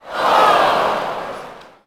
Ooh, Crowd, reactions

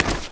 ledge grab

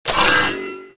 pickup health; player

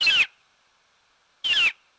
bird; Tony Hawk's Project 8; XBOX

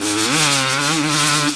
Mid, Engine

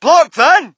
crowd, shout; Mike Tyson Heavyweight Boxing

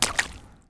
Small Splash

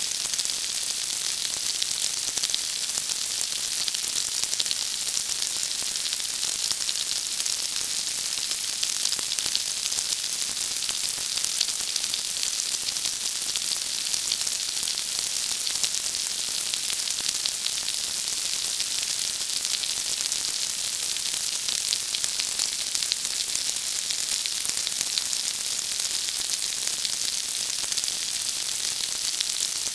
rain; Terminator 3 Rise Of The Machines; XBOX

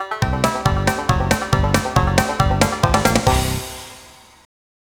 music; bilby; Ty the Tasmanian Tiger 2: Bush Rescue; XBOX

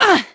GRUNTS; VOICE; CARENA; Pulse Racer

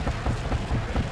TIRE WEAR; Cars; XBOX

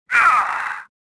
pain; swamp

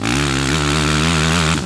Power, Engine

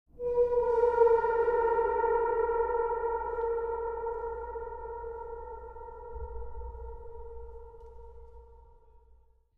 MUSIC

